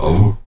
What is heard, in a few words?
VOX AHOO, Afro Chants, Stacc Ah Oos; Roland LCDP11 Africa VOL-1